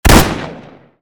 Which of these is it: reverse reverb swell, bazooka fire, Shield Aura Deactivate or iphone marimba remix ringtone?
bazooka fire